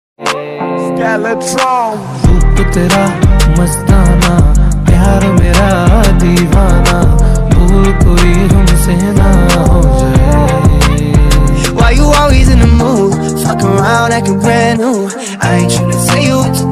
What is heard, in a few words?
Bgm Ringtone